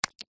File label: easter egg chocolate piece snap